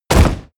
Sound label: hit sweetener; impacts